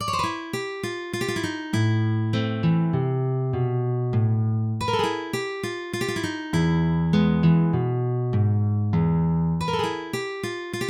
tango, guitar

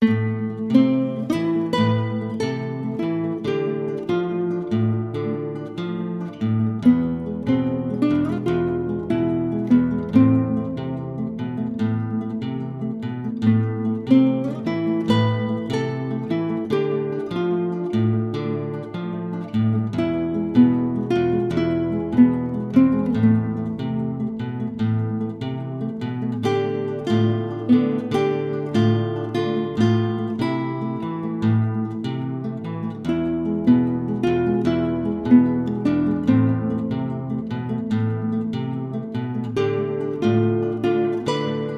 rumba, guitar

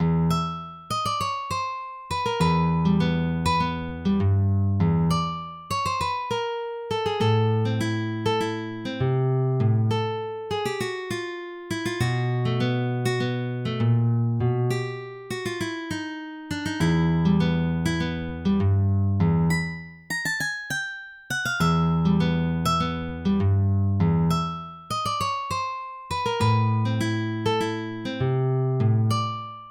tango 1 string, guitar

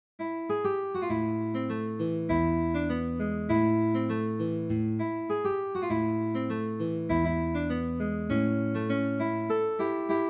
tango, guitar

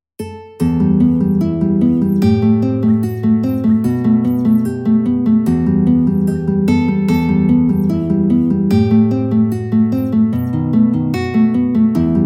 duet, guitar

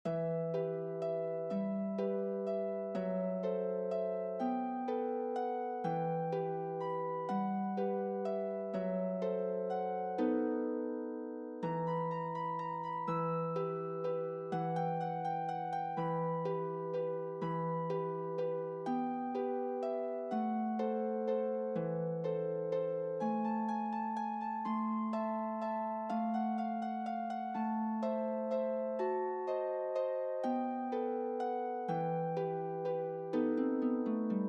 hrustalnyy vals, guitar

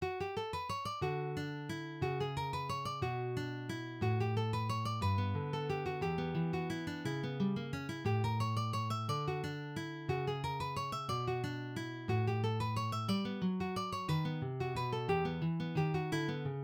romanticheskiy vals, guitar

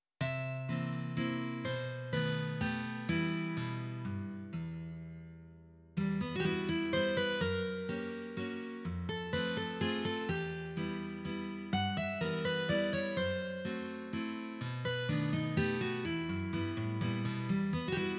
romanticheskiy vals, guitar

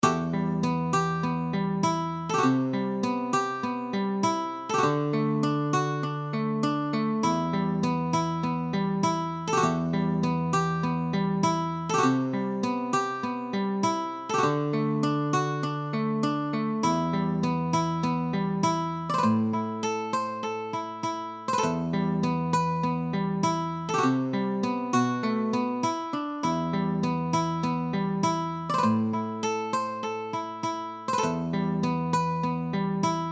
rumba, guitar

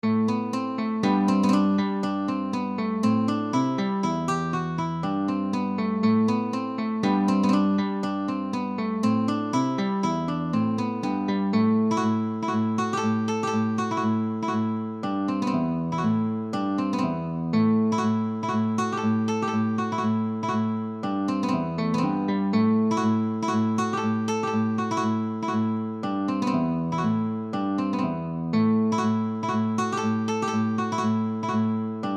scottish branle, guitar

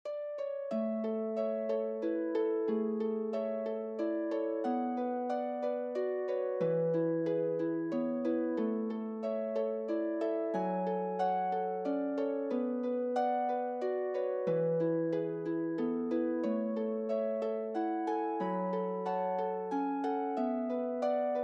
duet, guitar

